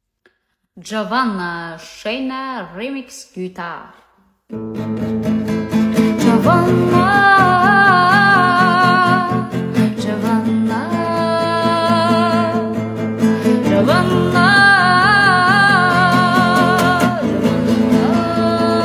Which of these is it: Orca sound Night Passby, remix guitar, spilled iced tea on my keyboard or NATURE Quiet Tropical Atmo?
remix guitar